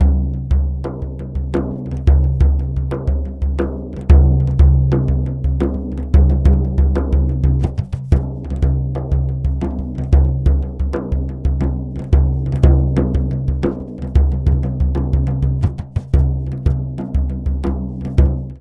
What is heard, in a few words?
Drum Song